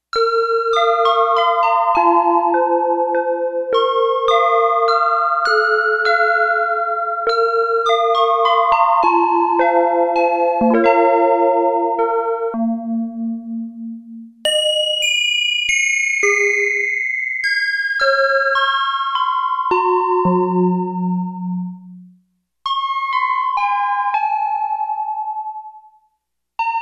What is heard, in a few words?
Toy Piano